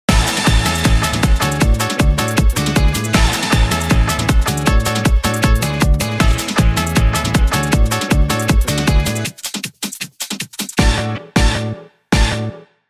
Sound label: off vocal; tiktok